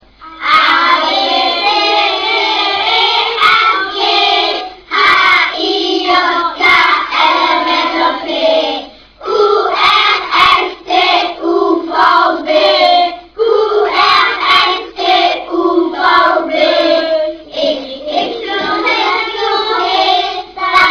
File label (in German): abc lied